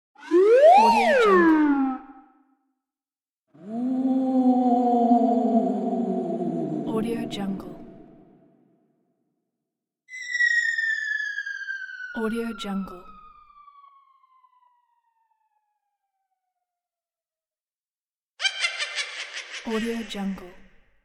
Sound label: cartoon sound pack